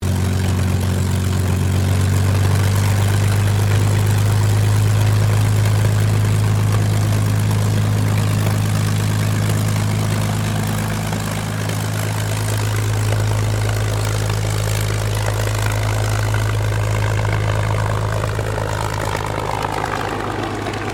AIRCRAFT CAC CA-18 MUSTANG MK23 TAXIING STEREO, WW2 Mustang fighter plane; Airplanes; SoundEffects-Foundation1